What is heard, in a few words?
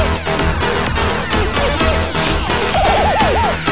Techno Man; MacAddict